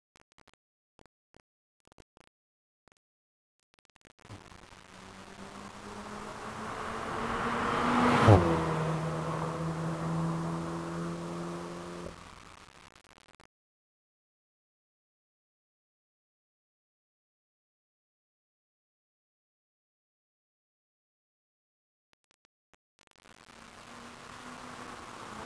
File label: golf drive by; barroom